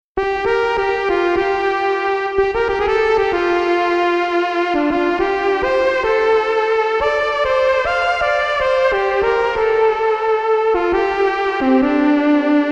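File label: trumpet synth guitar fx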